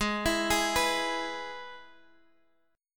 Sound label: Ab Minor Sharp 5th Ab, Guitar Open D; 18,19,18,17,x,x; down